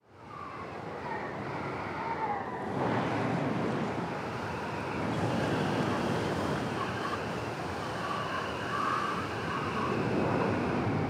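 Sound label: Ambience storm; game builder garage 2021 switch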